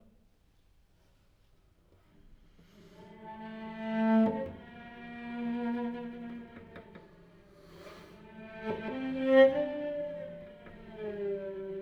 Cello sound

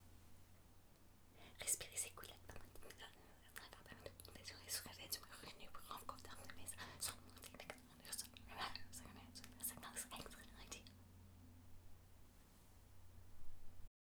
Voice sound

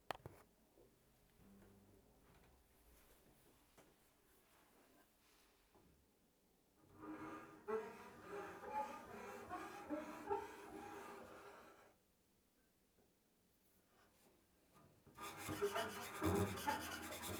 Double bass sound; Inga Margrete Aas

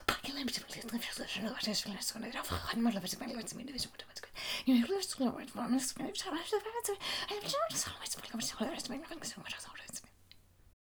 Voice sound

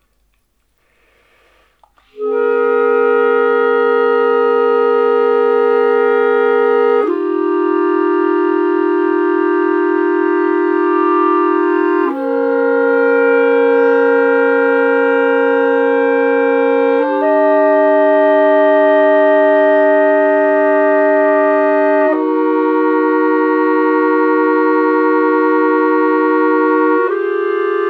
Clarinet sound A

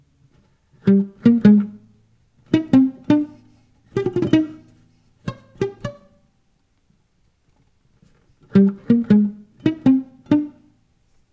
Electric guitar sound; Mikkel Sørensen

